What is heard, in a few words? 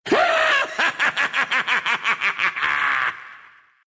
laugh, ru; Invo